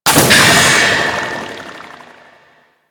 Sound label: Stealth Forward Transition; Warframe; Vehicles; Archwing Stealth